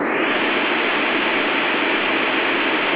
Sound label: wind